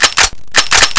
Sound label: reload